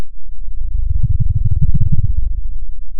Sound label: pop, Sound of a dinosaur